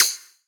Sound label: normal slider tick